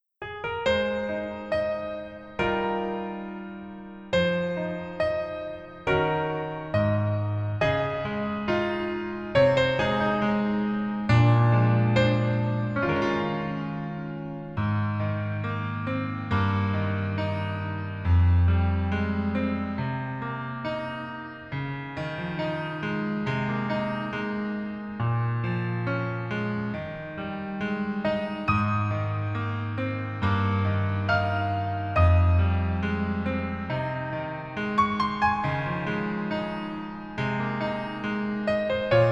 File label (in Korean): Piano ver, -1키